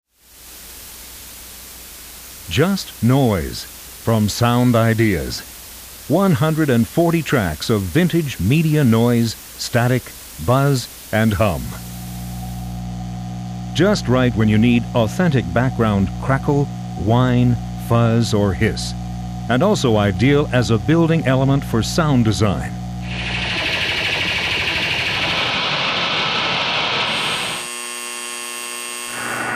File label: Just Noise Sound Effects; Sound Ideas